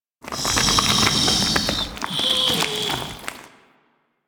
Breath; Warframe; Infested Eris; Ambience

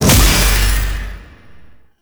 Void Beam Start; Weapons; Warframe; Duviri; Incarnon Adapters

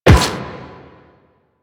Run Metal, Footsteps

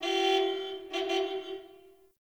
Distant Car Horn

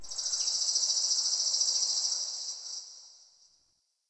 Bird, Ambience, Garden; Eternium Garden

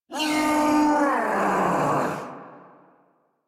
Attack Roar, Boss; Sargas Ruk

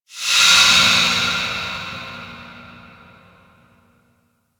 Infested Distant Breath, Ambience; Warframe; Corpus Infested

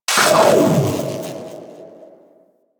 Bigalyst Electric Step; Enemies; Steps; Warframe; Teralyst; Eidolon

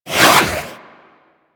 Boomerang Whoosh

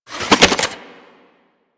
Fist Spin; DE; Warframe; Weapons; Archwing; Melee; Grn Arch Regor Fists

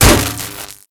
Electro Prod Hit; Warframe; Weapons

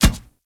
Step Metal, Footsteps; Warframe; Kubrow

